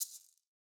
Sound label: maracas Single Hit Back Shake; Raw Perc One Shots; Slate; SD SEISMIC Drum Sample Pack